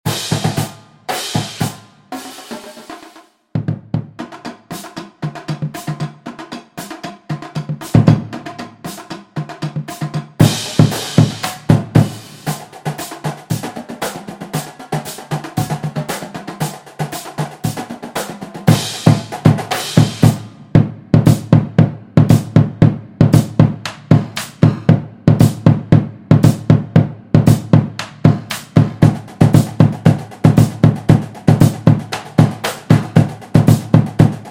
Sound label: cadence all Parts, drumline